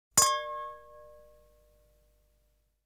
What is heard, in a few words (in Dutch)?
Klinkend Wijnglas; KMP Film Video Sound Effects